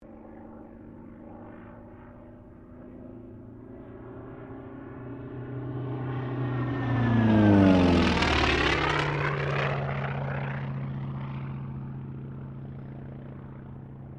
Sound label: AIRCRAFT T-28D TROJAN PASS; Airplanes; Sound Effects:Vehicles